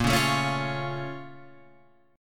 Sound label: A sharp Minor Major 7th, down, Guitar, Open F; A#mM7 strummed; JGuitar